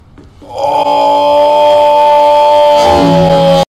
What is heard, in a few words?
ooooooh notification sound; big smoke